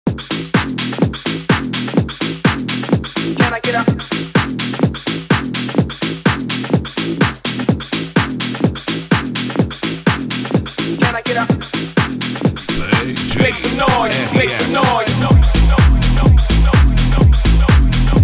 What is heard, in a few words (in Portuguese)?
eletrofunk; cd equipe revoada especial fim de ano